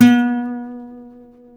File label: C 4 MARTIN 6, Guitars